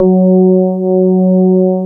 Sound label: DX PIANO; Wizoo - Powered DX